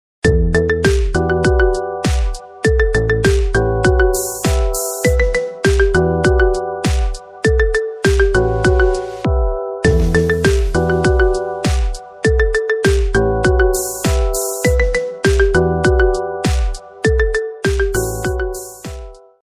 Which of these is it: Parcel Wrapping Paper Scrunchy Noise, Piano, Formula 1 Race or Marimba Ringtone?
Marimba Ringtone